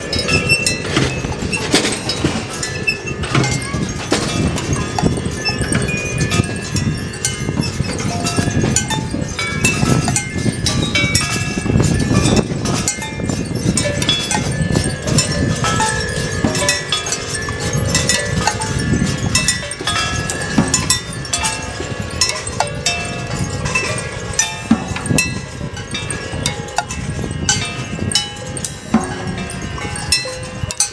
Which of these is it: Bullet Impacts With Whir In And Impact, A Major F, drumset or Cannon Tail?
drumset